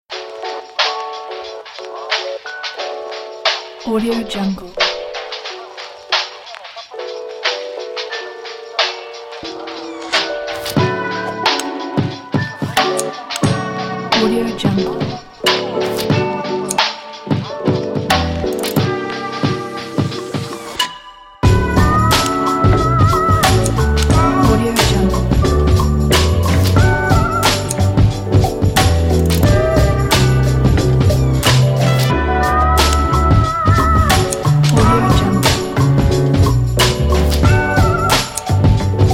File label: warm lofi hip hop